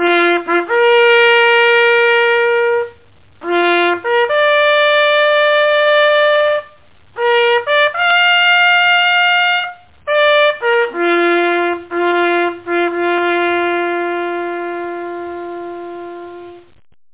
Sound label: bugle call last post